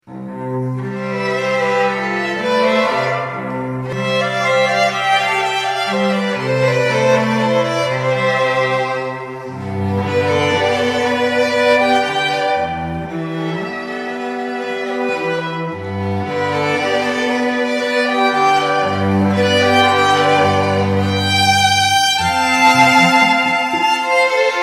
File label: strings